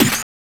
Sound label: SD, Drum & Bass, 130 BPM; Ueberschall; Drum & Bass Flash Trackz